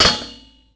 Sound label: grind metal on; terrains; Tony Hawk's Project 8; XBOX